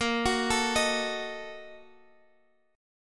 A sharp Major 7th Suspended 4th Sharp 5th, Guitar Open G